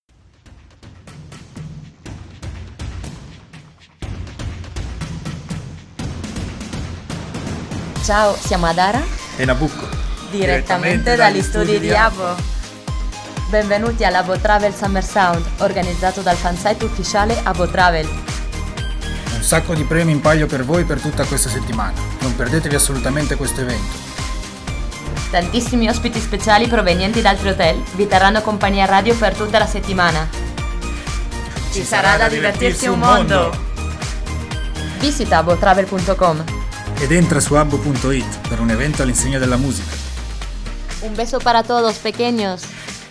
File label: Summer Sound Jingle